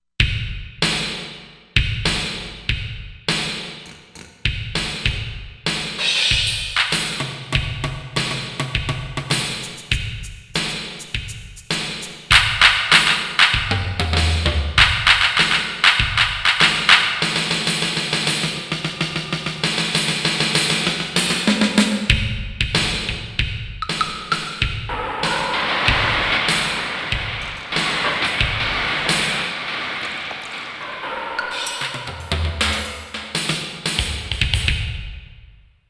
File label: SE & Drums, Korg MSC-16S; Korg MSC-16S Environment